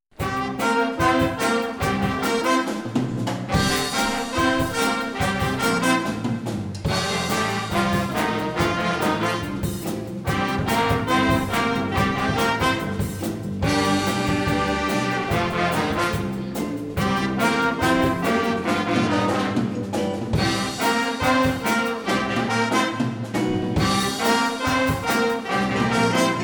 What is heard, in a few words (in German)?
Night Train Blues, trad., Musikschul-Ensemble